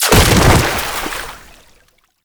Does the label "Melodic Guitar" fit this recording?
No